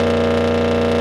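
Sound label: engine exhale, advanced engine sounds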